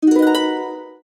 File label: Tranquil instant message, Ringtones; Nokia Ringtones; Audio themes